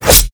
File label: sfx skill, ZombieSkill SFX; IdleRPG2024